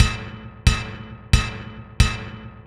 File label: JUNKYARD RHYTHMS